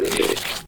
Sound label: crab spawn; Risk of Rain